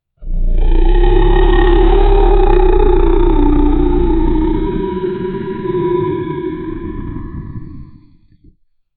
king kong roarning, ape

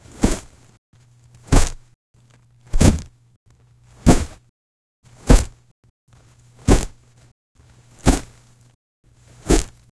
sharp percussive thwack

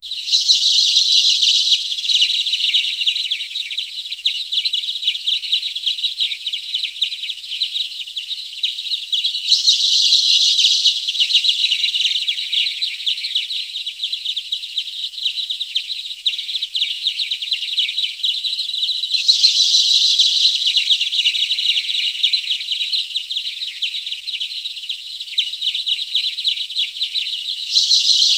birds chirping quietly in, forest

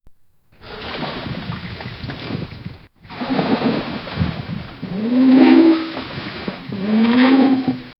waves crash wind howls, boat

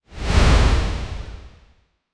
dark trap cinematic swoos

